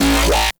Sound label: Alt Fire Growl, Infested Arm Cannon, Weapons; Warframe; Coda Bubonico